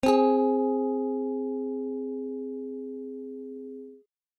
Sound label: string a tone c48